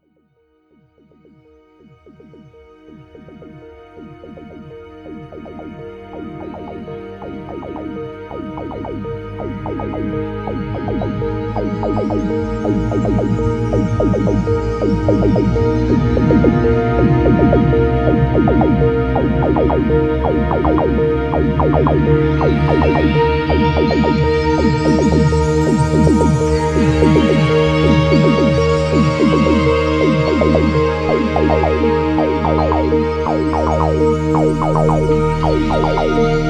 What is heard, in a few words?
Oberheim OB8 Mix